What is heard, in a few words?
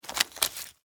Industry Extension Cord Pick Up; Factories; Backgrounds